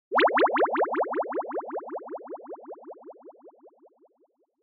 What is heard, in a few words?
Anime Motion, High Delay